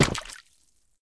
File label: splash